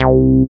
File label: SYN TB303, Roland